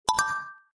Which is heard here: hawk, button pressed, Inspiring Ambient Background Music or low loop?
button pressed